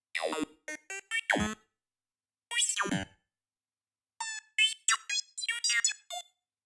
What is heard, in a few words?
Bleepy, XV-88